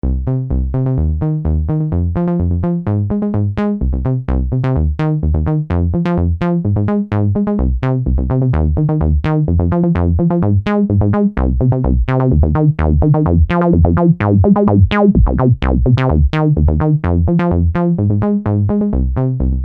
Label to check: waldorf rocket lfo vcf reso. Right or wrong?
Right